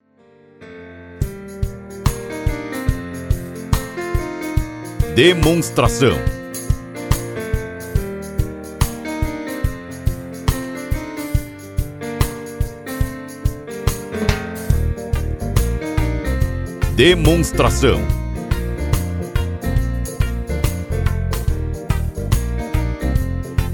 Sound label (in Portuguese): Trilha